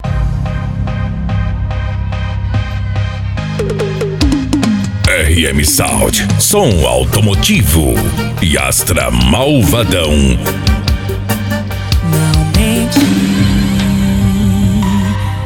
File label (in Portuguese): som automotivo